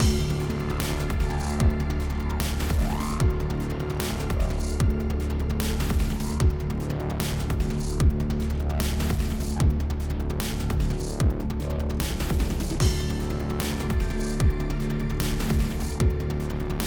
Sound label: Kingdom Chase 8CH 150BPM